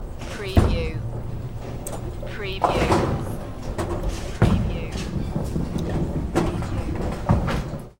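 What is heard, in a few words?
Bowling Alley Short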